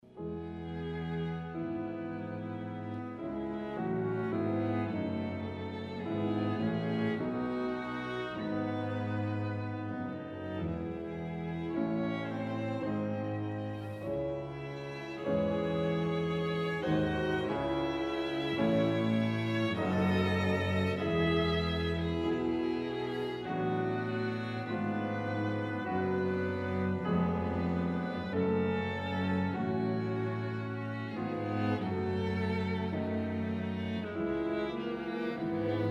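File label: piano quartet; kiel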